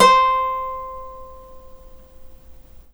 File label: NYLON GTR